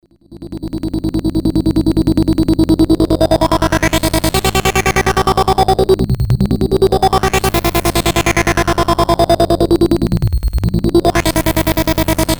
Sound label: synth seq, nasty arpeggio; Polivoks formanta